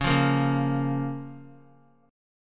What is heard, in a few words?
C sharp 6th C sharp down Guitar; C#6; strummed; tuning=4E,4A,5D,5G,5C,6F